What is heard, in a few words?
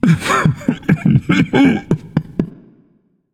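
non-verbal boohoo; smite; atlas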